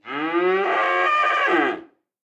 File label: cattle; fauna